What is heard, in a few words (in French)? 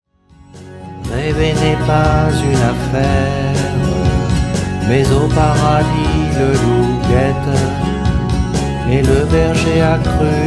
Chant